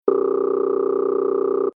Computer Interface Telemetry Computer Clicks Deep Phone Dull Cursor Movements Fast; Tom Hutchings